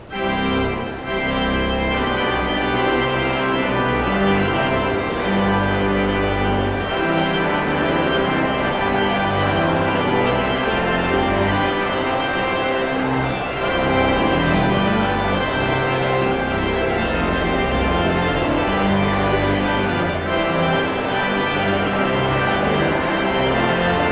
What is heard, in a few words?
Postlude